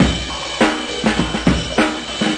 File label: crash n roll lofi, 101 bpm, drum loop; 101 to 110 bpm